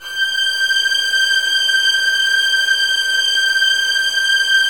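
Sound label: 2nd violins sustain g6; Sonatina Symphonic Orchestra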